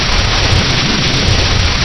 beam fire

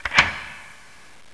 door unlocked

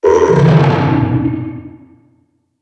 alien

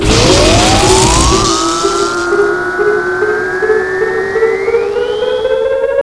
robo die; robolaser

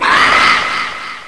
attack echo

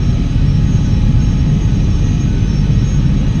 ship cockpit steady

